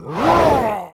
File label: Boss Attack; ZombieBattle; audio zombie